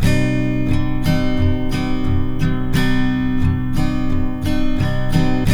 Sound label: TK MIN C#3, Guitars; Spectrasonics; Hans Zimmer Guitars VOL-1